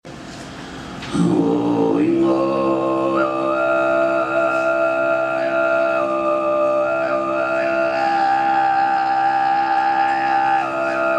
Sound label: kargyraa embellished